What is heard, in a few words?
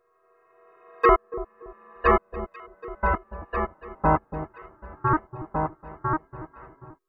Tape Arp